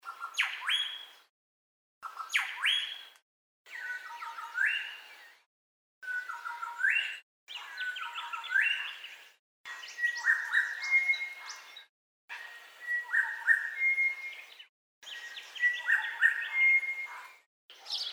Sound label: bird lithgow call sequence